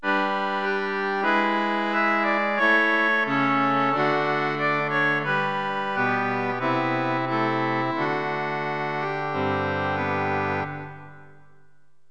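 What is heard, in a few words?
rock organ; gm-sound; Roland; An Invitation To The Roland World Of Music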